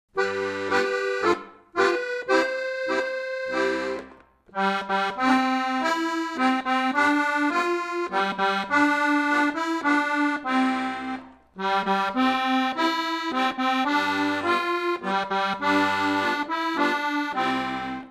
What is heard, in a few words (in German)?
hab mein wage vollgelade inst; Volkslieder